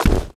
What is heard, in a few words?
padding run